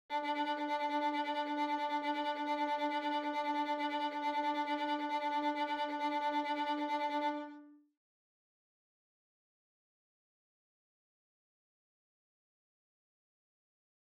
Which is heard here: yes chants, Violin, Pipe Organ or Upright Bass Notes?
Violin